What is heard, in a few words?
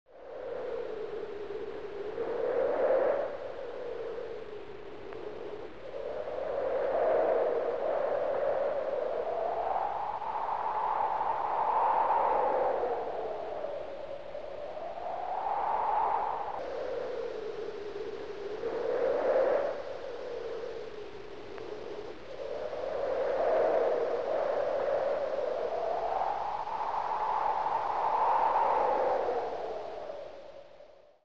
wind; Thunder